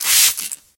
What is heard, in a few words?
activation spray; 摇钱树; ITEMS